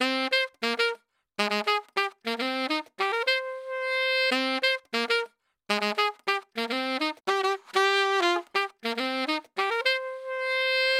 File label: MELODY; spinava historka